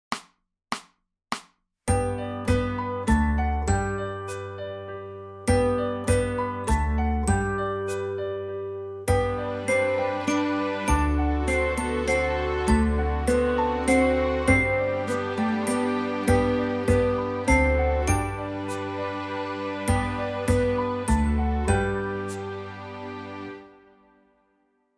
Winter ade Melodie; die bunte gitarrenschule band 2

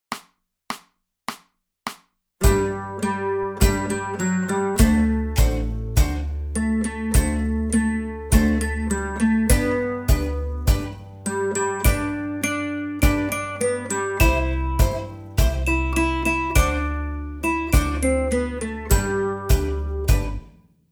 Schaut Euch mal um Melodie; die bunte gitarrenschule band 2

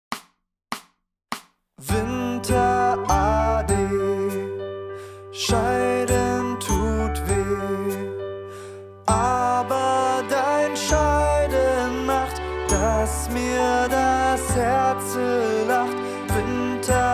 Winter ade Begleitung

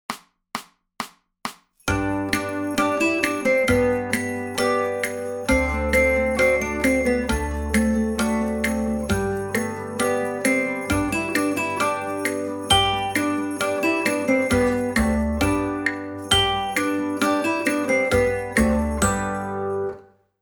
Lasst uns froh und munter sein Melodie; die bunte gitarrenschule band 2

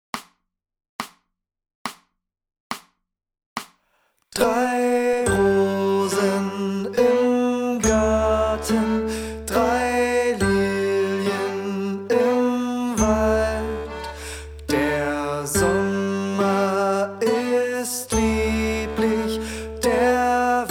Drei Rosen im Garten langsam; die bunte gitarrenschule band 1

